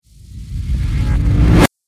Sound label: Trailer Riser Abrupt End, Rise And Dive Sound Effects